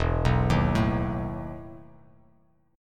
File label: E Major 7th Suspended 4th E; EM7sus4 arpeggiated